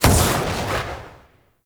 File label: Vial Ability Fire Shatter; Warframe; Warframes; Alchemist; Elements